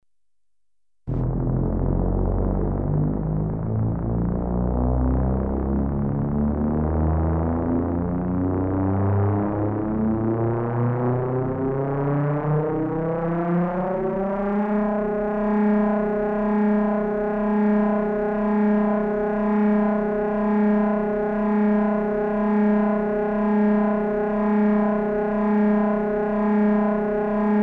Motor starts into constant run, Electronically Generated Sounds; Sound Effects Library